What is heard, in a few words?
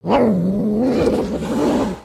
Pet Cat Growl; creatures pets